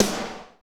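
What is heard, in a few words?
snare, Techno Rock; Northstar; Drumscapes Roland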